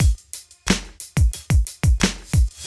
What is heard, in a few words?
90bpm Beatz; Optikal